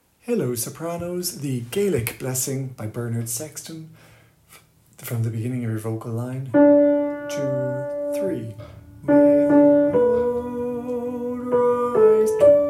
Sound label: soprano